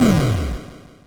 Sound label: screen shake; undertale